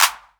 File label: Main Room Clap; essential drumkit